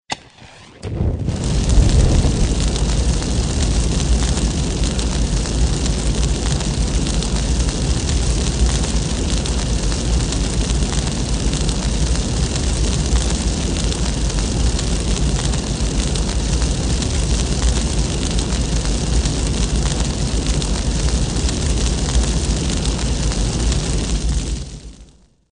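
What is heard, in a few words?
thunderstorm; ziranjie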